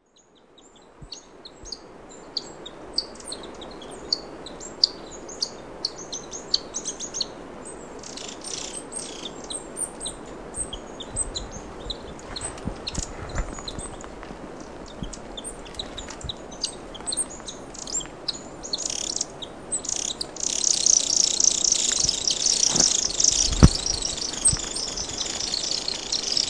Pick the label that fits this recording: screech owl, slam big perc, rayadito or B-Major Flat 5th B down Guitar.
rayadito